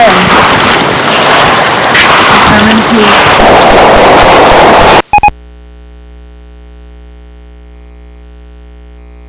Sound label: bang